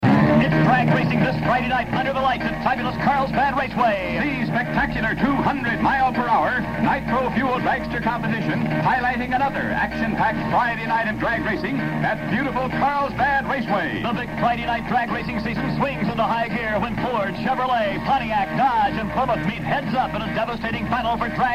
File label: car sound, raceway